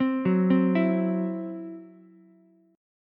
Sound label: E Suspended 2nd Sharp 5th, Ukulele; Esus2#5 arpeggiated